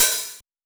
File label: hihat; osu